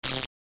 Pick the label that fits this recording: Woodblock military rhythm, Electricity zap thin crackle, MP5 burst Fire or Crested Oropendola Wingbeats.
Electricity zap thin crackle